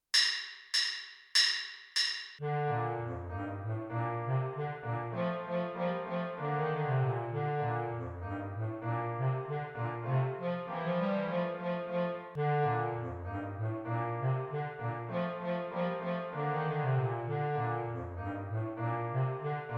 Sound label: finale for bass clarinet bass clarinet and piano